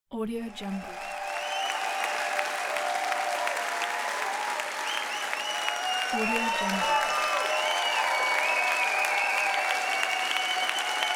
festival crowd whistling and applause

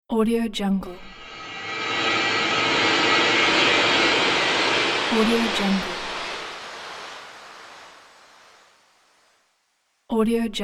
dark world of magic

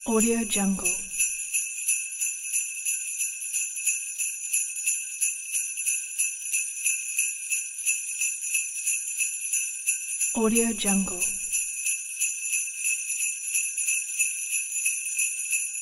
sleigh bells

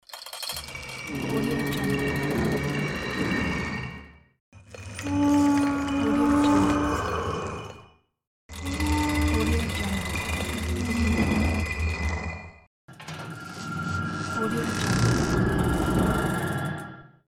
stone door opening

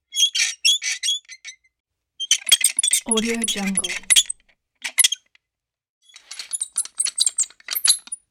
wheelchair

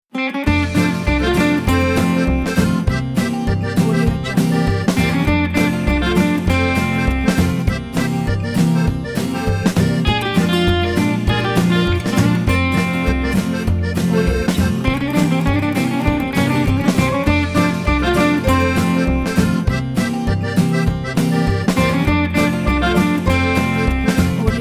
foxtrot party bar

